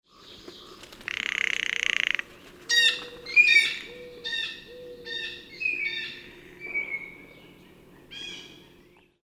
Jay Blue Rattle

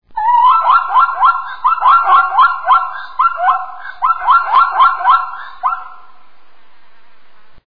zebra equus quagga

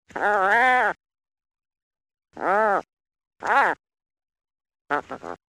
puffin

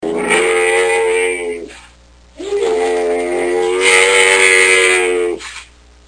camel; Средь песчаных «гор» пустыни «плывет» величественный корабль

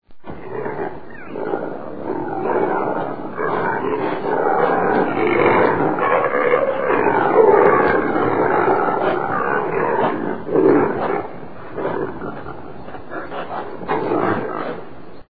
impala aepyceros melampus

